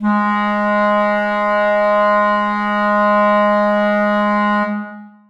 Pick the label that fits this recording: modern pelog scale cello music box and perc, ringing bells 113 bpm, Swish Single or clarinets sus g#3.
clarinets sus g#3